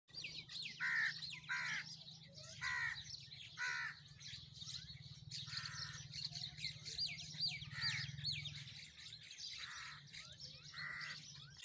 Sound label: birds